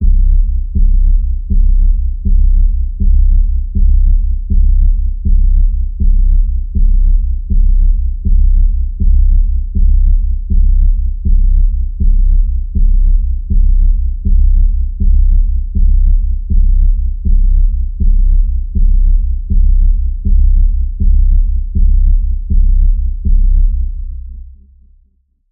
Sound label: Threatening Doom Pulse C, Key of C